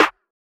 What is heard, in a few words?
Snare, trap drum kit